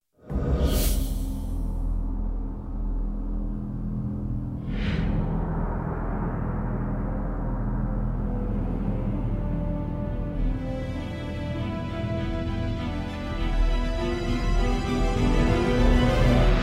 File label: Opening - Chinese (Mandarin); Wakfu S1